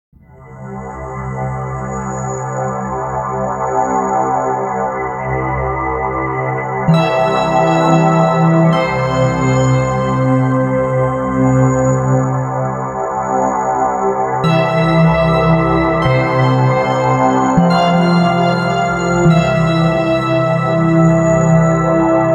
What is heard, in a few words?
instrumental